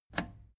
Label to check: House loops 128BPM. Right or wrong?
Wrong